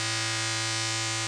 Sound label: imp